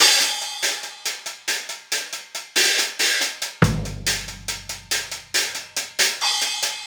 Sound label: Hi Hat Pattern